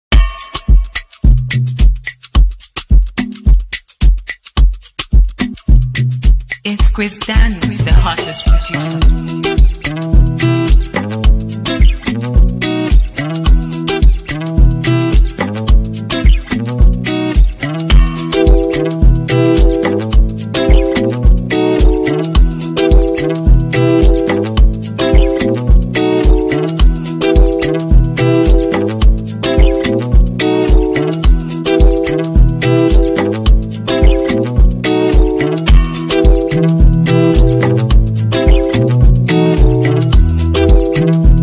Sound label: instrumental